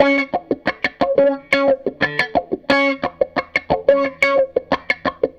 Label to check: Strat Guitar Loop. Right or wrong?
Right